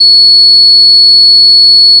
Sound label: fm cello single; FFTimbre